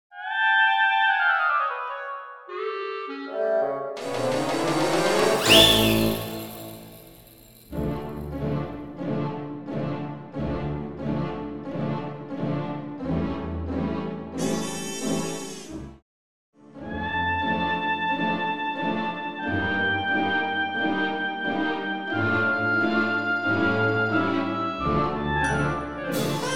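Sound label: Cat duet, Full Orchestra Accompaniment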